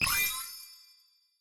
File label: arrow End; grandspinn